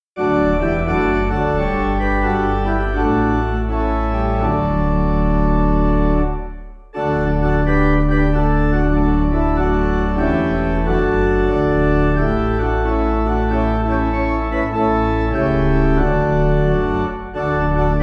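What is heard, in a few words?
pipe organ; Anywhere With Jesus; hymnary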